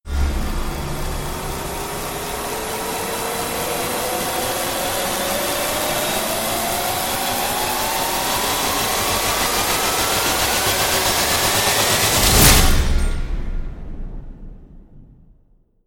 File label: rise long propeller